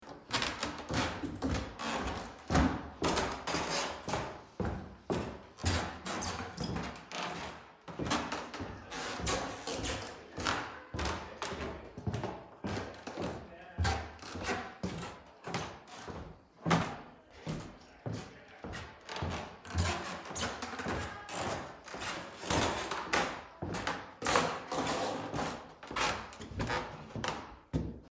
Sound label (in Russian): shagi po dereviannomu polu